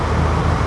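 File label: auto medium engine street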